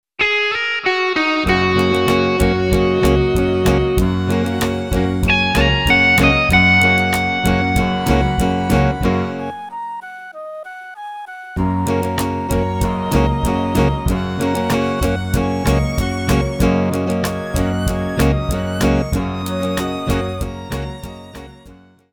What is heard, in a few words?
F sharp major flute music